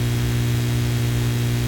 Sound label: Electricity Hums hum electric light neon loop; ProSoundCollection v1 3